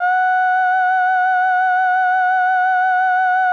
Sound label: F#4, SYNTH WAVES; Music Store Sound Service; Einstein